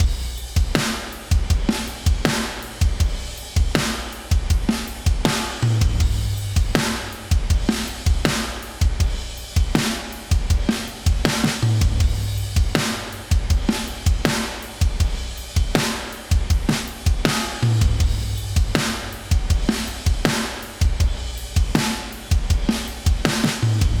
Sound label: Drums Without Loops, shoegaze